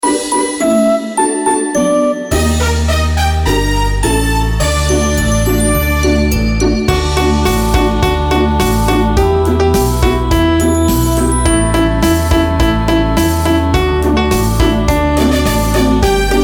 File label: karaoke